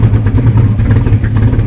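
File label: challenger idle,car engine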